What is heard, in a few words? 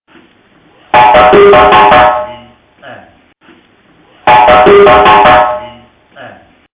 Kadan Break